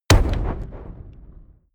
fire impact short; explosionen; comedy-cartoon; soundpool

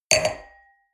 wine glass place table medium; berufe; soundpool

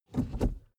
suv pull back gear across soft; verkehr transport; autos

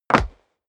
step leather shoes wood parquet jump; schritte

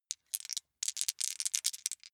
small three rattle in hand, wuerfel

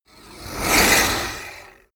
whoosh speaker harsh

